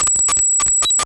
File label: Raw Glitch